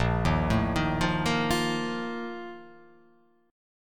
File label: A sharp Major 7th Suspended 4th